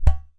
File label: udu pop, Udu Clay Hand Drum; ETHNIC and WORLD PERCUSSION